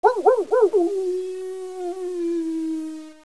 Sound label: wolf howl; Caesar III